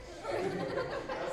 Laughing

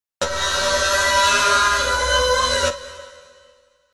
Monster Scream

